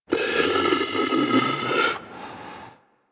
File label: Predator Snore, Hunting Critters; Warframe